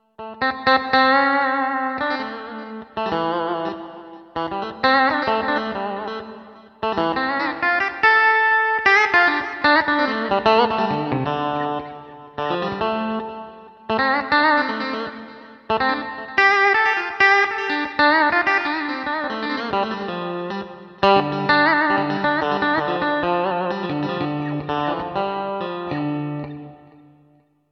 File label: Yamaha Genos Super Articulation Guitar